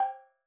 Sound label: Steel Drums Layer G5, Calypso Steel Drum; Percussion